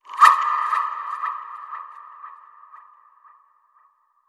Quirky Whistle Whoosh

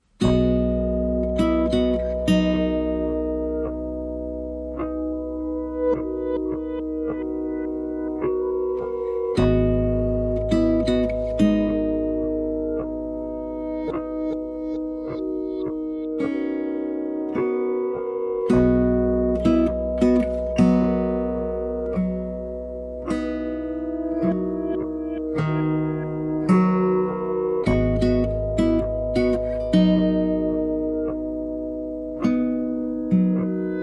No Melody

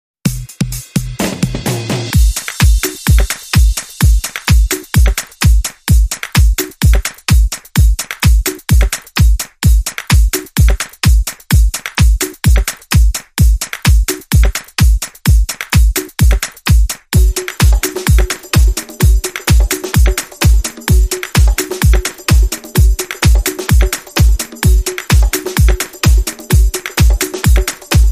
Beat

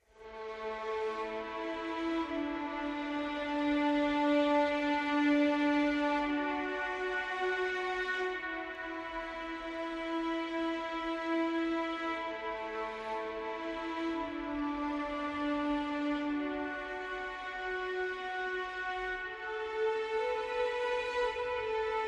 Legato Strings Only Mix

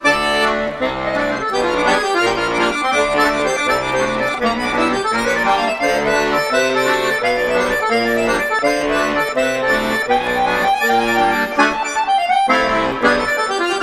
Irish Fusion

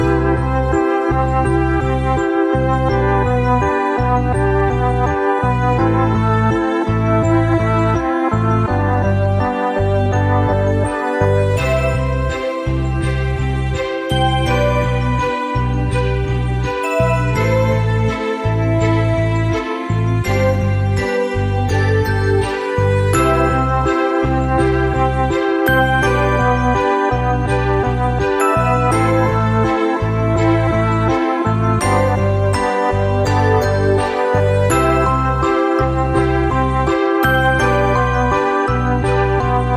No Drums Loop

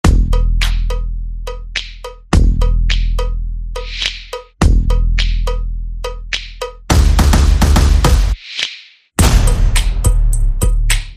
Percussion Bass and Effects

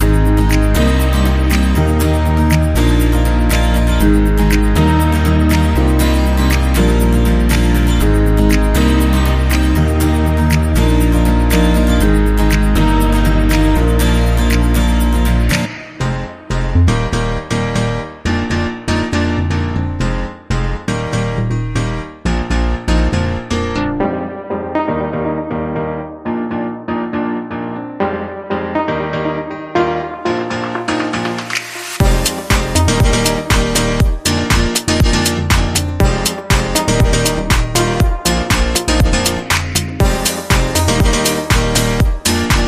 Whistleblower Flutist, Rhythm

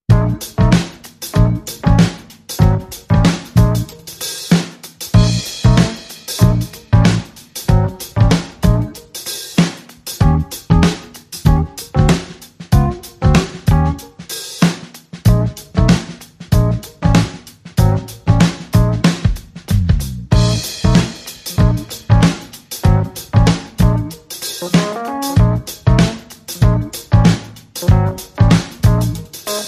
Sneaky Funk, No Vocal

